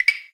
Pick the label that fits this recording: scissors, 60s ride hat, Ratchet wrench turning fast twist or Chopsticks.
Chopsticks